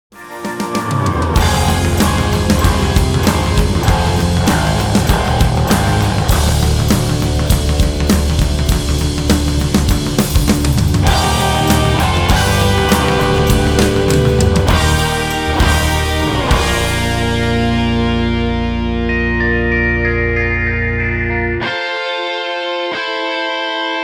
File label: Instrumental